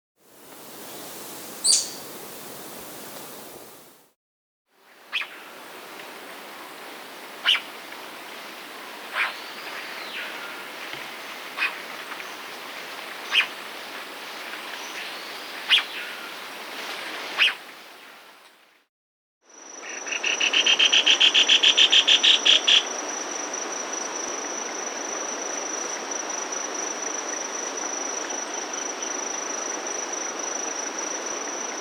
Chestnut-Crowned Gnateater (Conopophaga Castaneiceps), Andean Birds; S. America